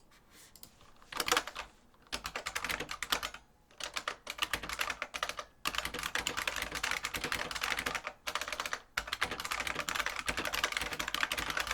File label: keyboard